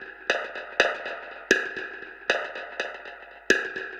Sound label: Drum Kit Echo Snare 120, dub drums samples, 120bpm; musicradar